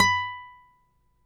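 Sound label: nylon, FLAMENCO, Guitars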